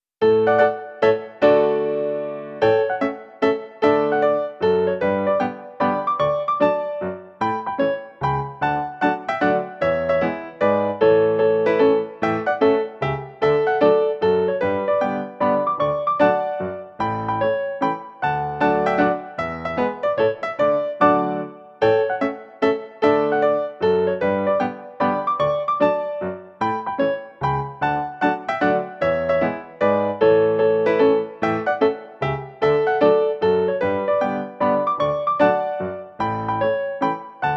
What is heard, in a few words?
Mazurka; Delibes